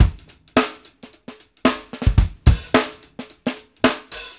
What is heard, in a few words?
SWING STGG, drumworks; Sampleheads - New York City Drumworks VOL-1